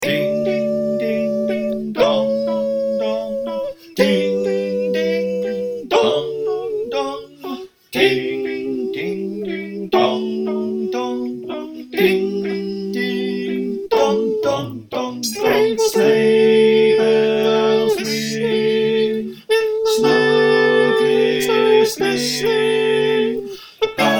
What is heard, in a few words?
SATB